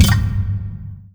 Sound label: fs fm lightbridge, footsteps; player; Portal 2